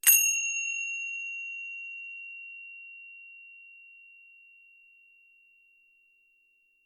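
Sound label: tibetan cymbal contact sound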